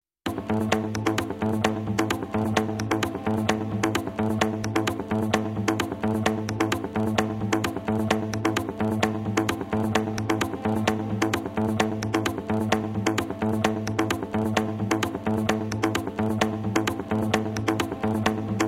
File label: Beat